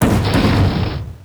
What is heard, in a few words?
die; Hodu; Mob